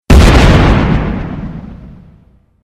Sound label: explosion; mvm forever